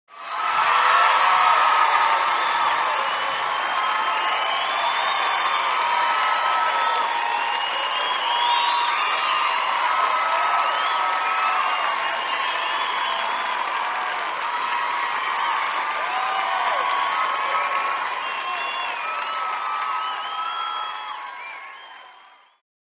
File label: Huge Extended Crowd Ovation, Ebbs And Swells Very Excited Concert Crowd